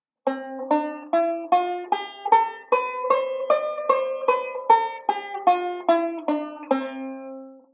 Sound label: C Ionian, banjo; Beyond Chord Melody Volume II